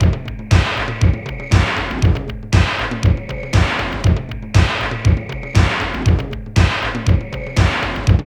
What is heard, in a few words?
LOOP, Tekno Industrial; East West